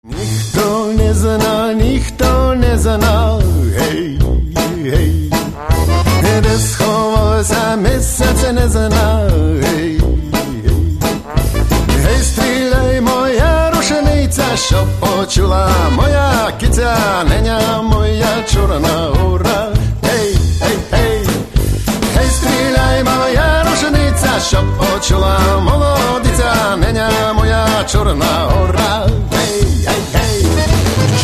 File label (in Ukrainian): skrypka vechornyci